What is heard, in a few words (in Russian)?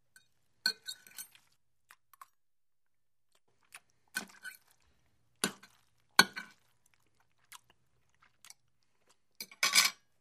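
ест вилкой чавкает бросает вилку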